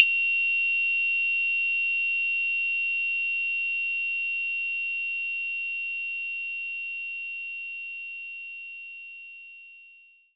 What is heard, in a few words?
Steel Drums D5; knights try